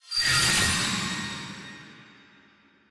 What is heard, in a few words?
wheel turn; turn your fortune